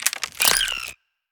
Egg Shell Open; we are the dwarves gamerip 2016

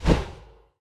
Swamp Monster Throw

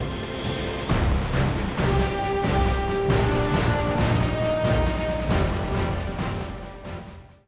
Preview Stinger; apex legends season 12; Bangalore

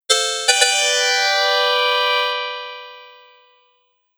Bonus

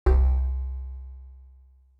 Collide

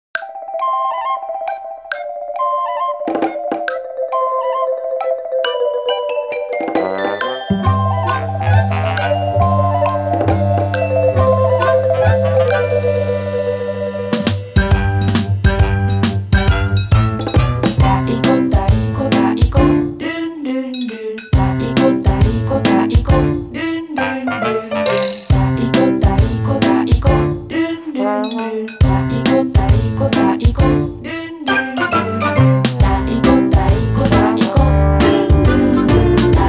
Ending BGM; Taiko no Tatsujin Wai Wai Happy Rokudaime; PS2

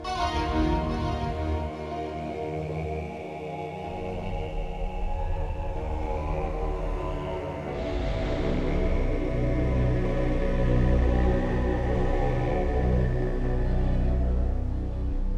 Nali Chant; Unreal

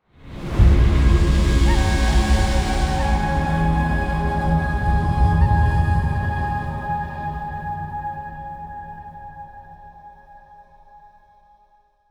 Stinger Siege Win; Eden Rising

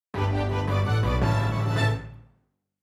Perfect Percussion; Level 2

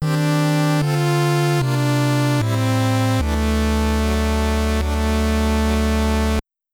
Appearance Fanfare; Metroid in the Action

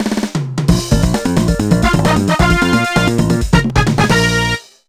BGM Opening; Sam Power Footballer; NDS

